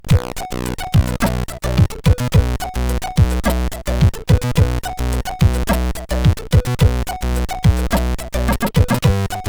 Breakdance, CSG8580R5

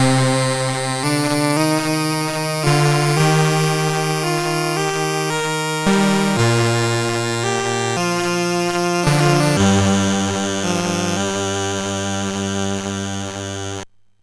Invader Alert, sid MOS6581R4

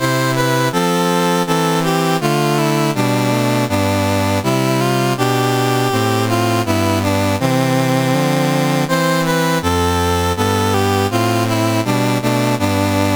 CSG8580R5, National Anthems; Gambia; Dave Marquis

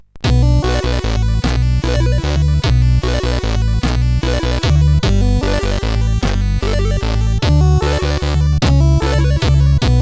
Waltz Blues, CSG8580; CSG8580R5

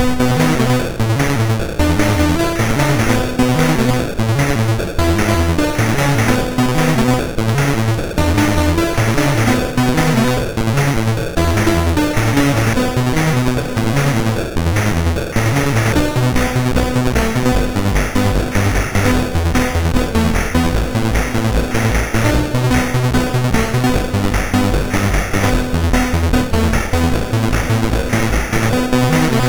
Synth Sample, MOS6581R3

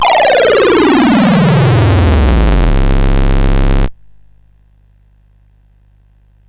Dragonworld sid CSG8580R5

